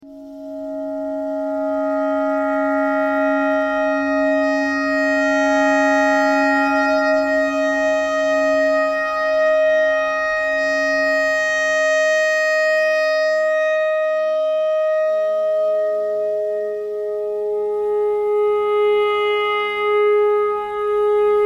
clarinet notes e clarinet thread simple

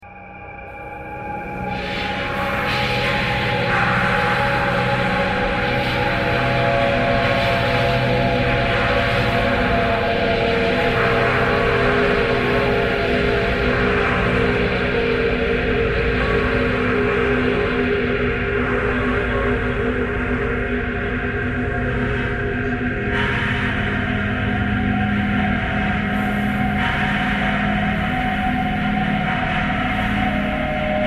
thread harmonic

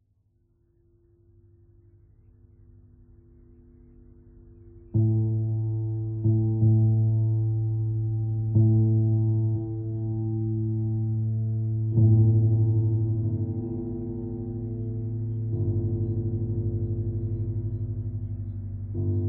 piano thread noise

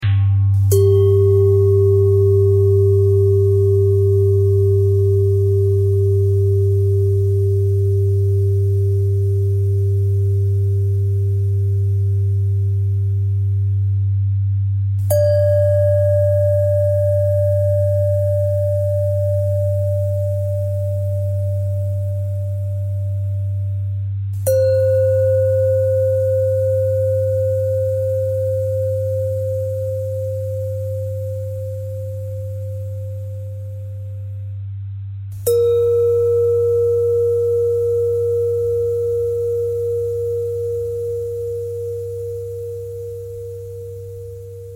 bell thread pentatonic; bells

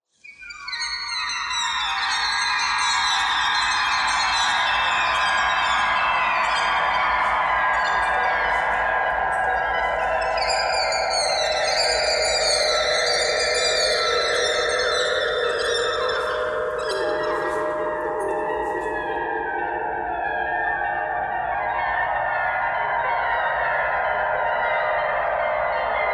clarinet clarinet thread noise; clarinet geese

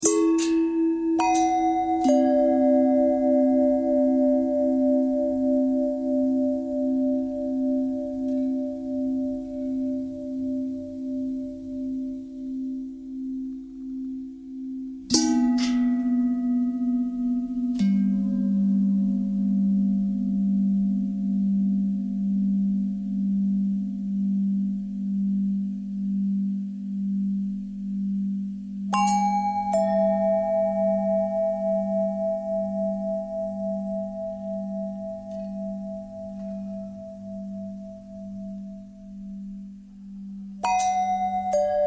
long bell long bell thread pentatonic, bells

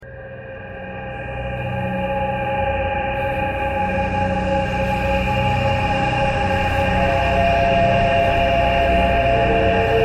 thread pentatonic

